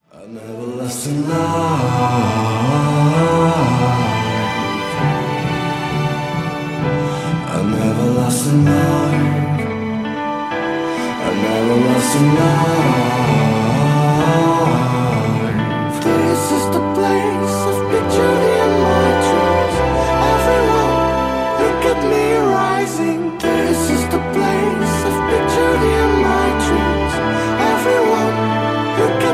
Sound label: Orchestral